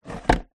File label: office plastic paper drawer tray slide close